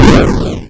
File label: break